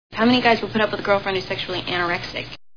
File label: scream